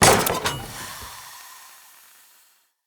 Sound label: Cage Open; Encounters; Animal Rescue